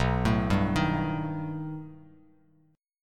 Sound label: B Augmented 7th B down Bass Standard; B+7; arpeggiated; JGuitar